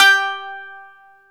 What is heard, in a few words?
baglama G 3